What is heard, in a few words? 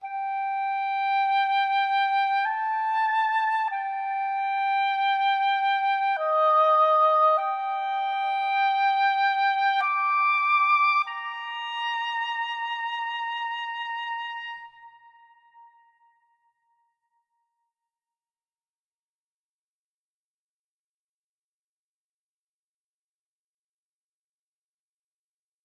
House Boat - Ambient, Oboe Stem; PS4 PS5 Switch Windows Xbox One Xbox Series XS